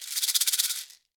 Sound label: Maracas FX; Latin Percussion; Drums; Drum Hits; Shaker; Ableton; Factory Packs